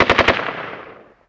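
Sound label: gun ak47 medium; Call of Duty Modern Warfare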